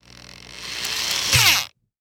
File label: Industry Tool Drill Power Drill Auto Drill Bit Tighten Fast